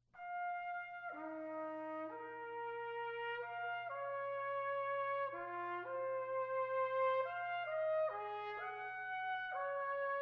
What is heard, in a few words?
Brass Quintet, Adagio